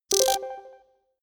notification bleep

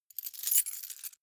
key ring large rattle; objekte; schluessel

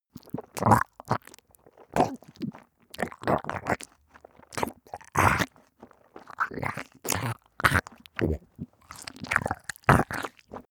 death burble long; koerpergeraeusche; menschen; soundpool

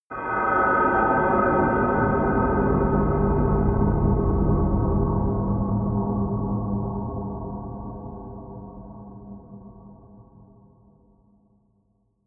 air grey wind, horror

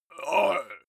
male shout hit weak; koerpergeraeusche; menschen; soundpool

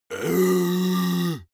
monster growl hoarse; koerpergeraeusche; comedy-cartoon; soundpool

